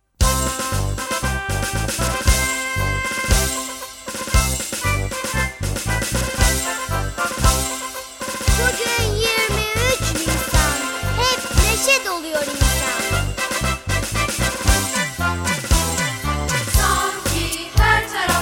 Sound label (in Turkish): Çocuk Şarkıları; 23 Nisan